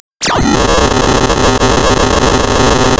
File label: Sound of tearing paper, center